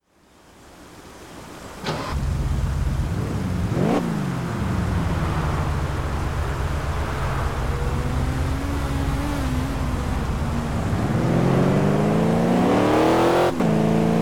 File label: audi s6